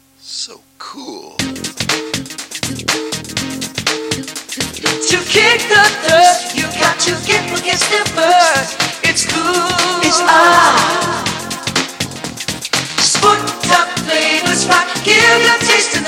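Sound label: Aahhh reverse; VO talent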